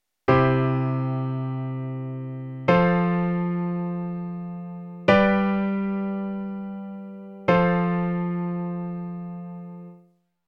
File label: Verse in C example